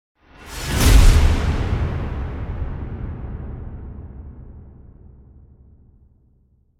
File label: Impact Metallic Big Shocking Strike - Strong Reverb; Hollywood Edge; sound designer's toolkit v2